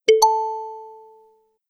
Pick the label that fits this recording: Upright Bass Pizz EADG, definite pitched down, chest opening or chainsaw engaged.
definite pitched down